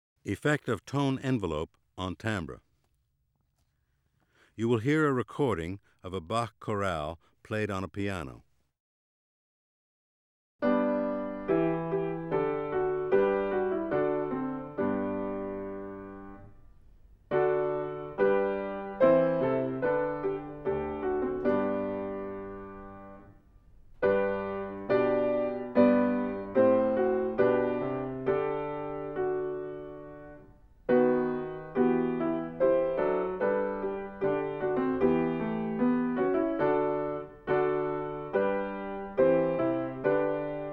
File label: Effect of tone envelope on timbre; Normal; Psychoakustische Grundlagen der Musik